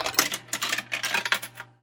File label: coin slot; zps; The Labs